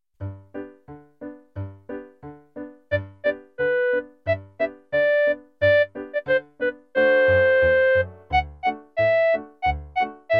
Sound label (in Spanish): Polca de la estrella CLARINETE